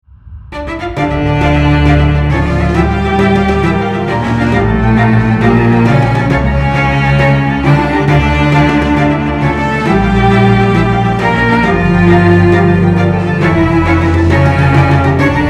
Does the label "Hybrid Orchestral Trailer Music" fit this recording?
Yes